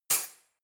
cutlery knife drawer place hard

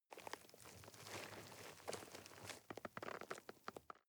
nylon leather minimal

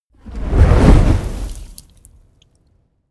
whoosh fire ball crackle medium

